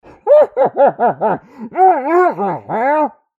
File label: airblast; Pyro